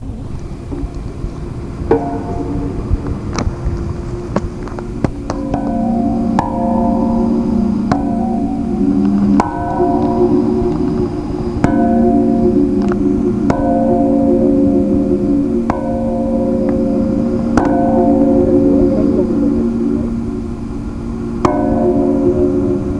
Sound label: wire sounds